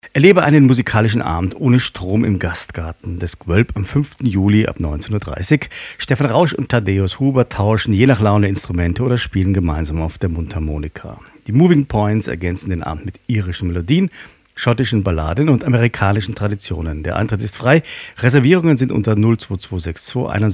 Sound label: Live Unplugged